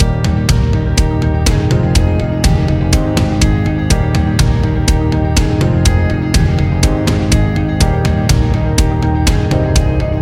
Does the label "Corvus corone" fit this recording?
No